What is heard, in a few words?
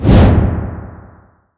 remnant create; weapons; dota; ember spirit